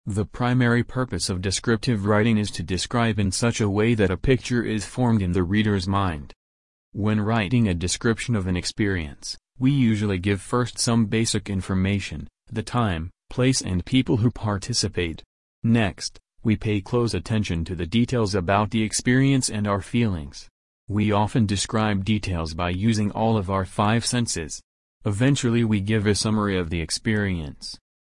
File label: audio background